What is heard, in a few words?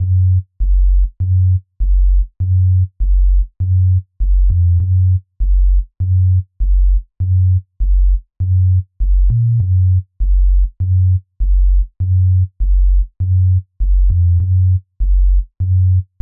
Bass 100 F-